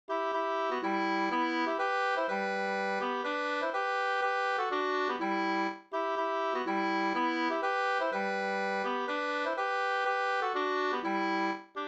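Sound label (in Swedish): polska, tvåstämmig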